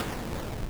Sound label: bigshot; ReisenYoumuOuting